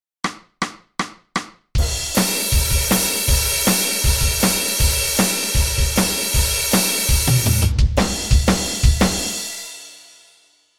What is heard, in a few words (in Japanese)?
Rock風 ドラム